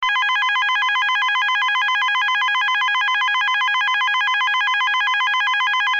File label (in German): ton, Signalgeraete